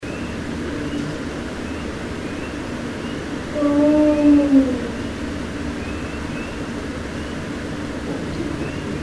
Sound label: voice, bigfoot